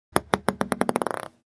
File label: Ping pong ball drop bouncing until still